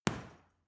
boxing punching bag side hook medium; sport freizeit; boxen

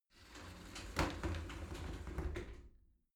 shower slide door close soft

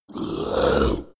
monster attack gurgle low; koerpergeraeusche; comedy-cartoon; soundpool

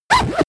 zipper midsize run fast; objekte; reissverschluss

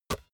basketball single hand catch soft

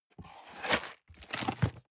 cardboard box open unfold; objekte

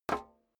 step high heels metal dry slide short; schritte

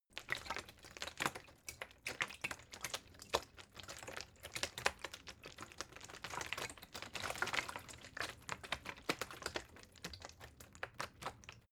bottle water sparse handling; gastronomie; berufe

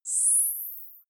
zoom out, signale

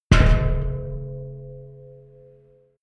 massive metal gas bottle low

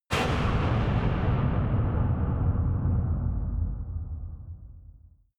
massive metal impact; impacts

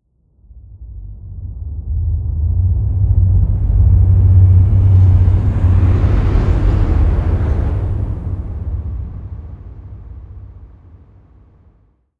storm drone, risers

